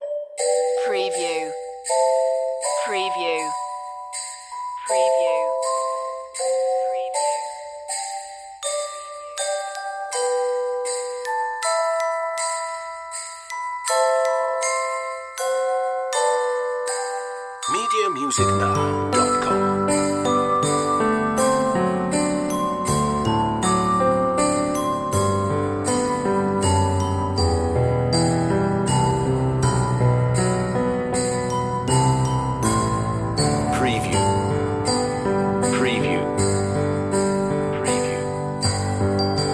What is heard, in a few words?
Oh Christmas Tree, on-hold music